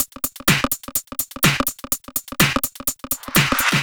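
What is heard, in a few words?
No bass drum, Drum Loops 125bpm, Nu Dance Electro; Breakin Riddim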